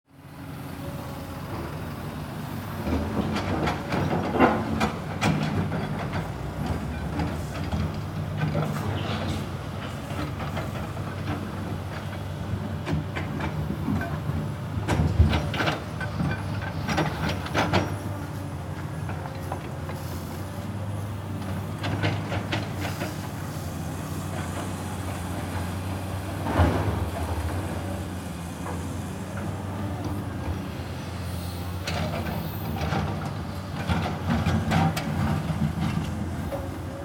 bagger

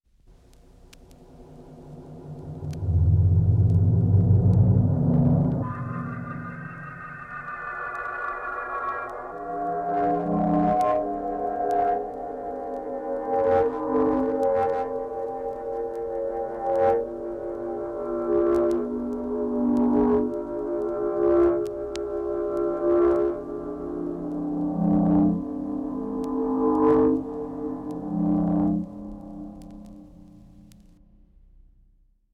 Science-Fiction Weltraumfloeten

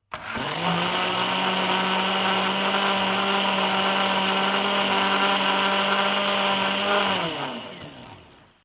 Mixer Stufe 1

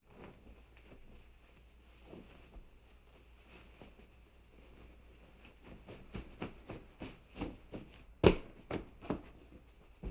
Hausarbeiten Heimwerken Betten machen

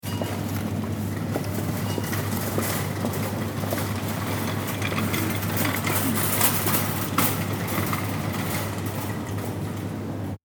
Supermarkt Einkaufswagen

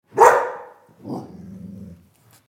Hund Begruessung

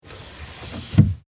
Auto Schiebedach

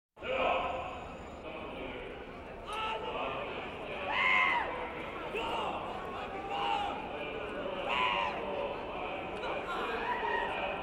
Sport Menschenmenge Beim Pferderennen

